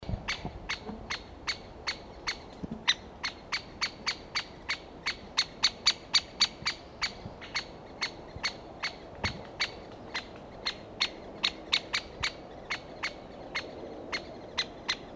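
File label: Dendrocopos major; Dacha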